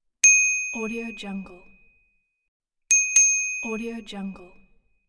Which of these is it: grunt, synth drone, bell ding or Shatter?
bell ding